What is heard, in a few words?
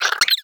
vox twitch, creatures, snark